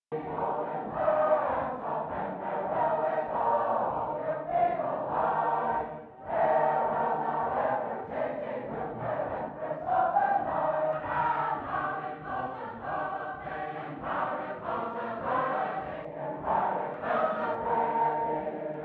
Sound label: soprano, sacred harp singing